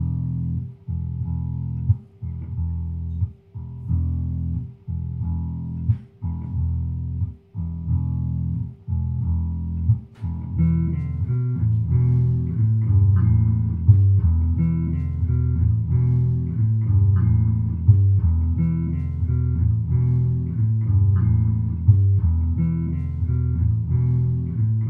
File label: Loop